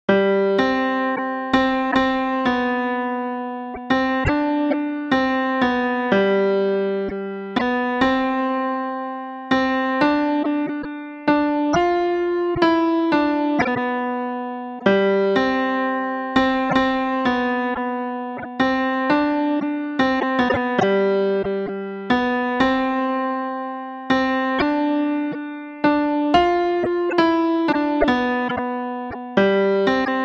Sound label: piano